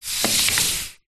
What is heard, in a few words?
household brush scrub tile wall clean single long scrub